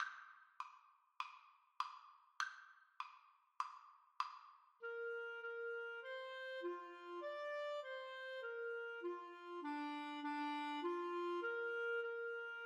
Clarinet Duet

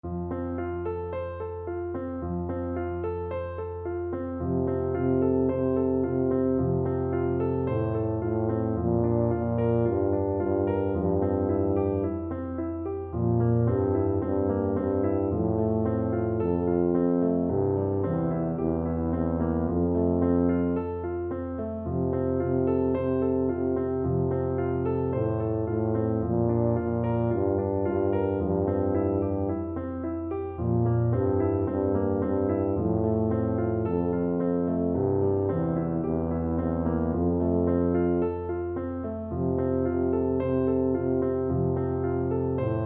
duermete mi nino, tuba

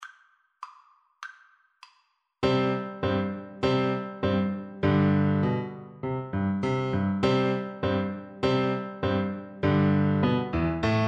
Oboe; Beethoven Turkish March Ruins Of Athens Op113

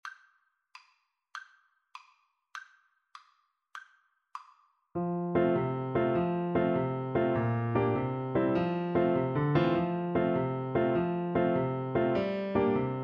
itsy bitsy BN, Bassoon

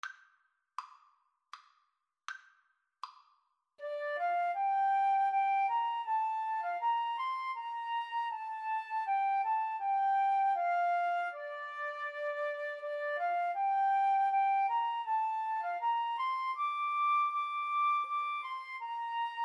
flute duet

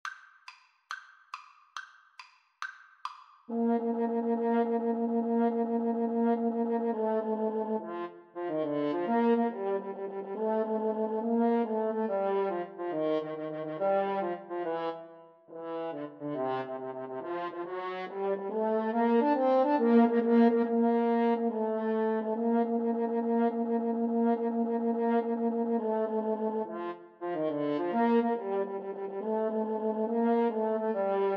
French Horn Duet; mendelssohn italian

